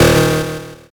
zap

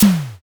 pistol

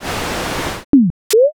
gacha roll

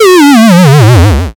powerdown

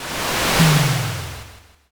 fire magic

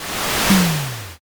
fire magic

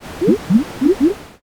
poison

